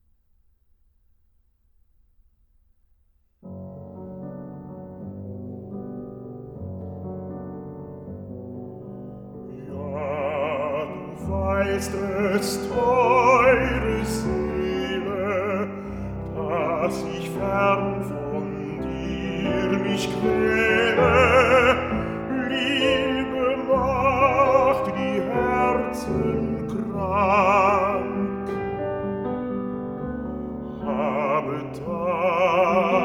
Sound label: song for voice and piano or orche